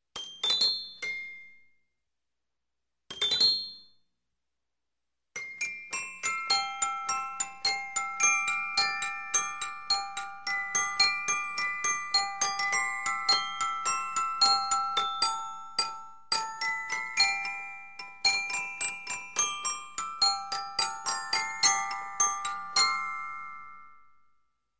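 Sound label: sounds of music box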